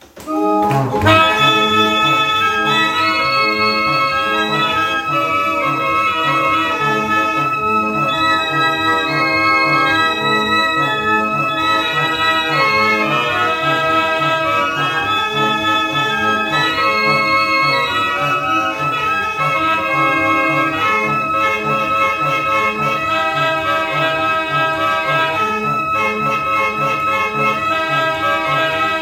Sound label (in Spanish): organo de salon, instrumentos mecanicos